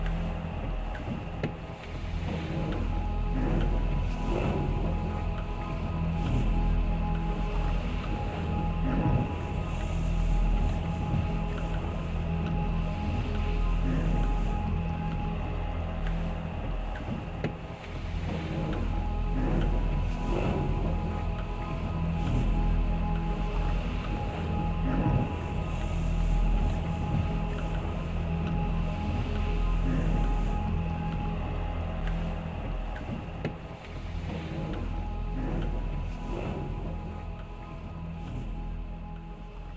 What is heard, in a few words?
Progress Music; Ballhalla